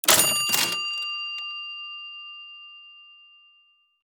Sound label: Cash register bell cha ching purchase